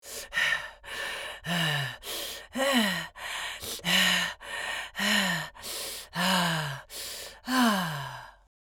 Female old woman tired breathing

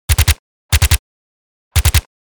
MP5 submachine gun two three round bursts; war; gfxsounds

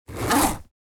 Purse zipper noise open close

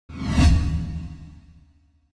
Futuristic whoosh

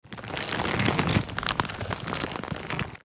Old shopping bag rustling

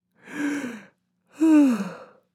Woman yawn

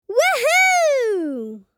Woo hoo cheering boy child kid

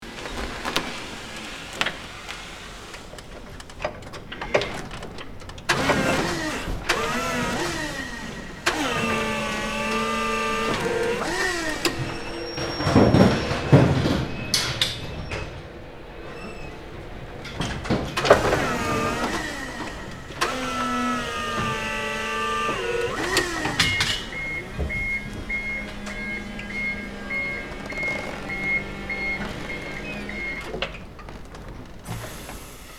Forklift loading unloading positioning forks

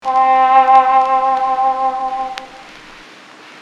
Vintage muted trombone on vinyl

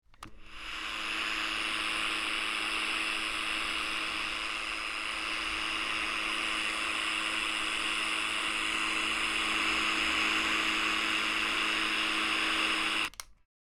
CB radios walkie talkie radio static loopable

